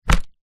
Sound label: leisure lego box lid remove full; zapsplat